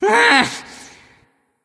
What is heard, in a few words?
"ugh"; smite; voicepack; sylvanus